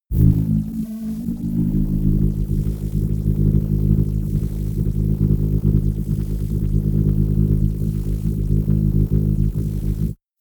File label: drone synth bass sizzle; musikelemente